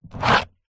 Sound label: industrial toolbox plastic heavy duty pick up from concrete